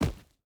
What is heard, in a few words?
footstep boots forest jump land; Rust